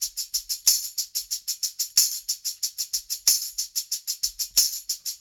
Call And Response Tambourine, 70s Analog Funk; Sonic Foundry (Sony Creative Software); What it Is!

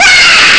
uargh; ST-XX Amiga Soundtracker Sample Packs

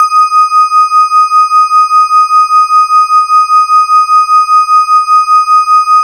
E-HARP, DX HARP; Wizoo - Powered DX

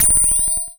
Hat, Cwejman S1; Megenta Hat; DevSnd Cwejman S1 - RD

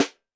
percussion, Roland TD-20

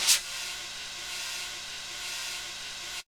New Jazz Snare; Snares

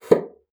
BASSOON; Xsample VOL-6

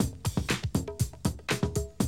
120, Classic Drum Loops

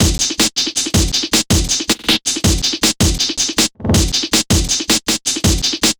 Hard Stepper Loop, Drum Loops Double Time; Zero-G Smoke

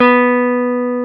Jazz Guitar

